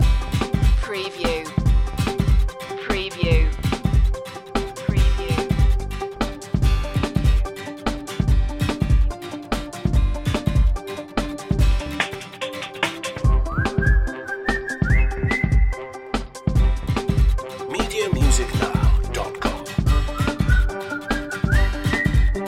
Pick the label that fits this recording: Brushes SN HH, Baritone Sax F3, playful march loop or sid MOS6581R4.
playful march loop